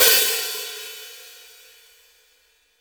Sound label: HH OP, Drum Dry; East Collexion; Drum 1